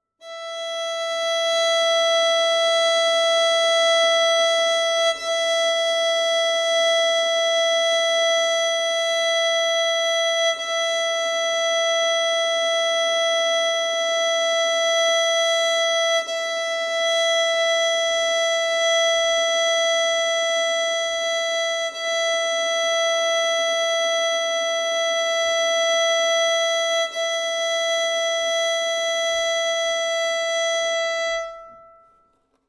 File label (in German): geige e saite stimmen